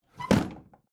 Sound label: bathroom drawer open heavy